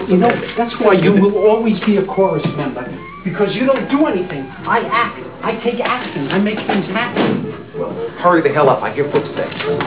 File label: chorus